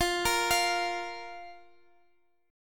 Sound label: A sharp 5th, chord